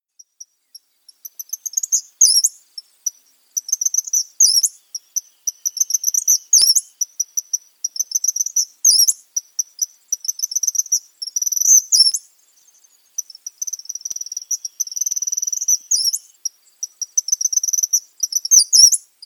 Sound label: Eastern Kingbird